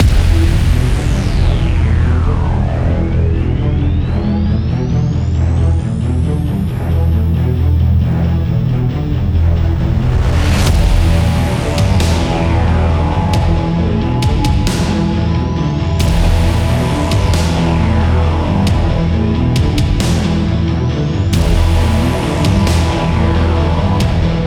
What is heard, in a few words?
title screen; octogeddon